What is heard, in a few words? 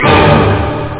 bang; extinction; Mission Over